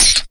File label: SFX, 180 BPM, Drum & Bass; Ueberschall; Drum & Bass Flash Trackz